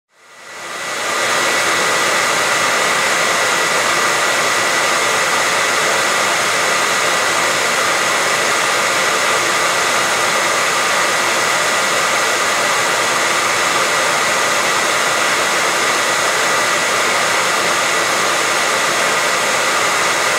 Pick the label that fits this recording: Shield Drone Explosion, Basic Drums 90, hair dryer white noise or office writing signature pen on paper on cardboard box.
hair dryer white noise